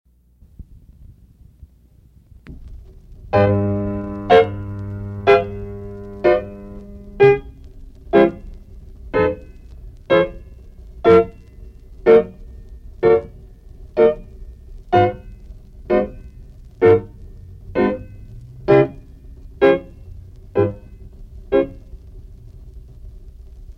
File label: shuffles